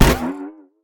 wallbounce; smite; kuzenbo

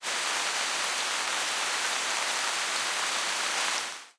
defeat rain; smite; chaac

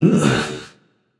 ugh, voicepack; smite; jorm

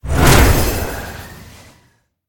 pwr lift; smite; tiamat

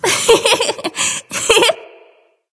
laugh; smite; artio

